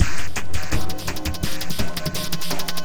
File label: LUNAR PHASE GROOVE COMBO; FRENETIC FRACTAL GROOVES